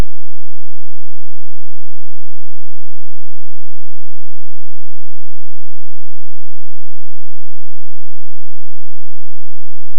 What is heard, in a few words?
dc offset positive 0dB